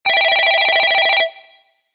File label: Old Ring; LG CU720 Shine